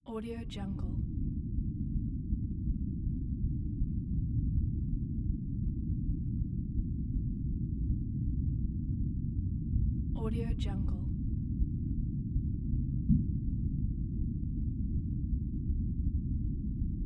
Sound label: the room tone